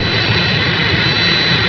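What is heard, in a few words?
engine; Nintendo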